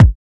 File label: solid kick drum sound a sharp key